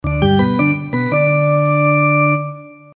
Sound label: Ice Hockey SMS